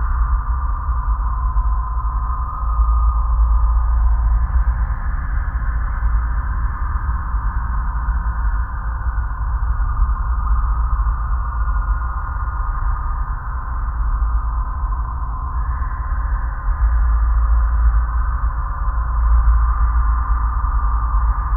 Ice Harps loop

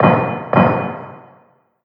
Random Metal Hits X2

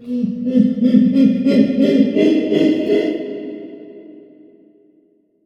Gorilla

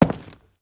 Soft Wood Walk

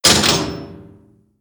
Floor Elev Countdown

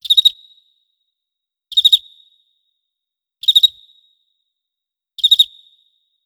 Chasm Cricket

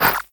Grid Force; moul

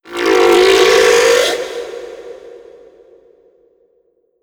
Critter Male Verb; Kahlo

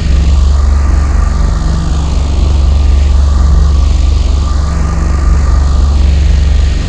Giant Imager Loop

